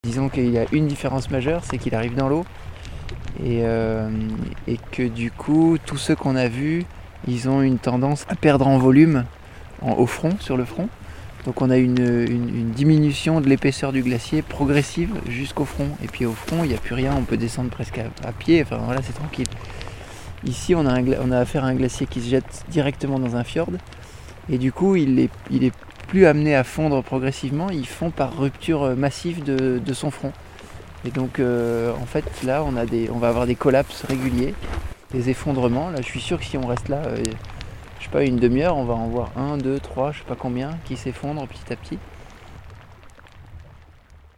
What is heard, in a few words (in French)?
crepitement glacier